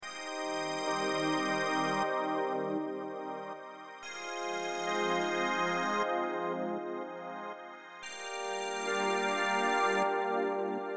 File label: C 120 Elec GTR solo chords